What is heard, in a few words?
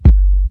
90s kick sound g key, Royality free kick drum sample tuned to the G note. Loudest frequency: 74Hz